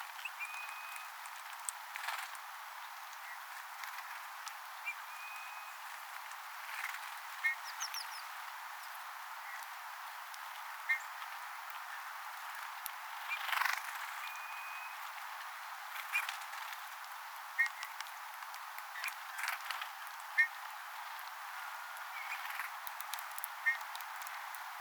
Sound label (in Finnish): punatulkun pitkia venyttavia aania